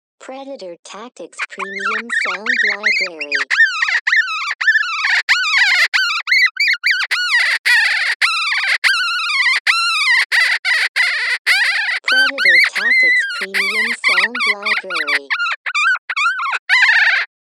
Quiver Bird

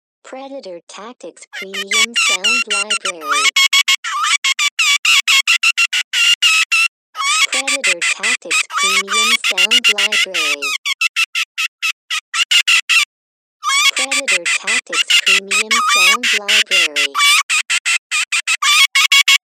Raspy Bird

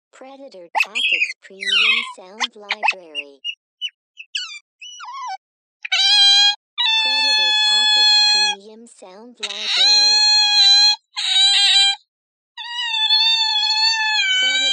Otter Nights

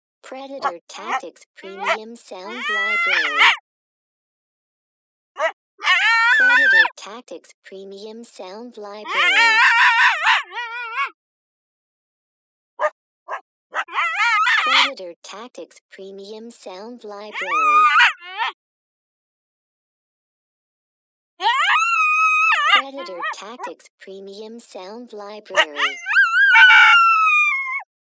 Young Male Howlin

